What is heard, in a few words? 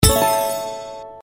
hit; Royal Demeanor